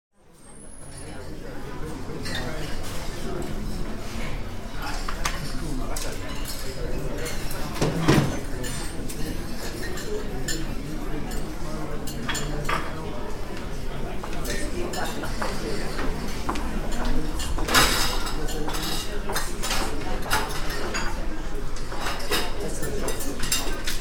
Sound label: cafe